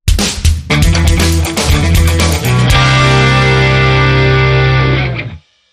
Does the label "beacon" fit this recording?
No